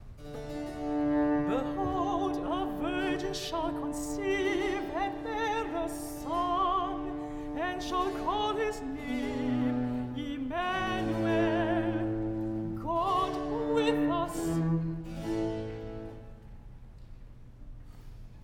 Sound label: Messiah: Movement 1: Behold, a virgin shall conceive